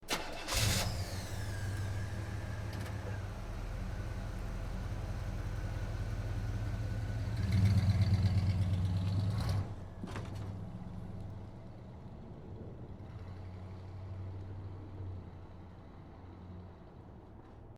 muscle car start idle and drive away

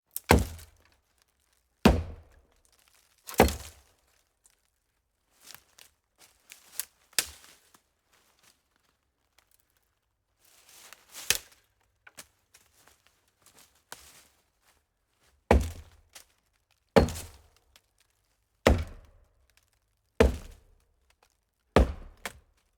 chopping hollow tree

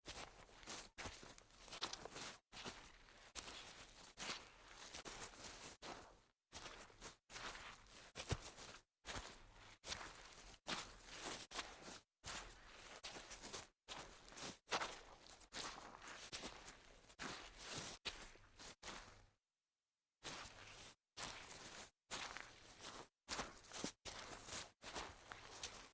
sneaker in soggy grass series

